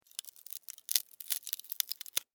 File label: Termite, Bite, Wood, Splinter, Crack, Insect, Bug; Termites; Animals:Insects; Blastwave